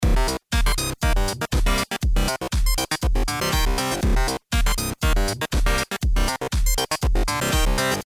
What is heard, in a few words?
pulse bass